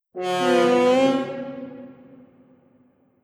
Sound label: Rallying Horn